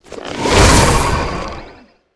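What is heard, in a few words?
Ghost knight Attack Skill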